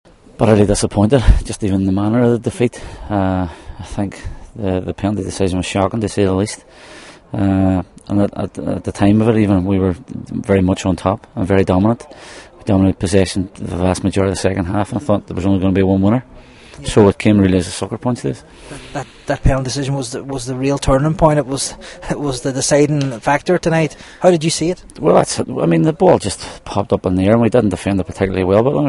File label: HARPS